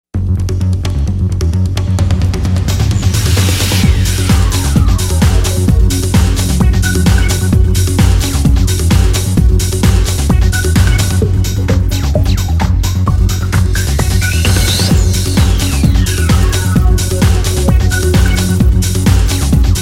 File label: Bass Drum